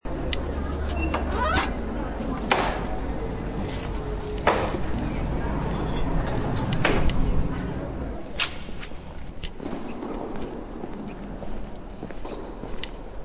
gang durch den dom

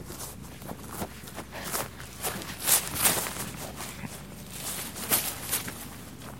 schritte gras

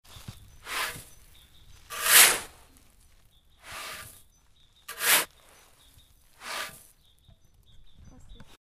sand schaufeln

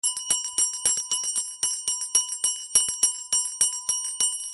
gloeckchen alt

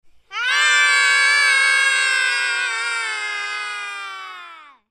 kinder schreien

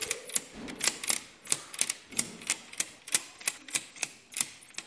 kicker punkteanzeige quietscht

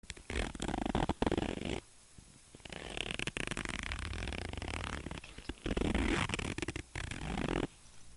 reissverschluss

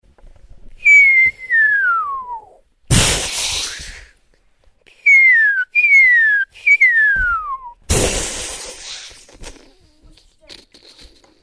raketen

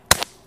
Ast bricht

